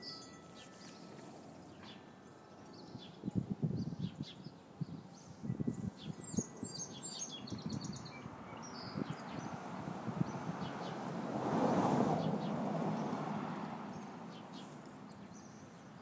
behind the sounds of traffic the sounds of spring in brooklyn